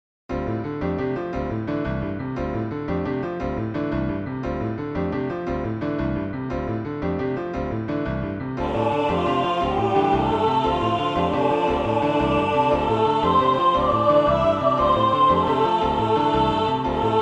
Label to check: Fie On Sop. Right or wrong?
Right